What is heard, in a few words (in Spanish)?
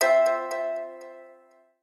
alarma; gps; logistica; alarmas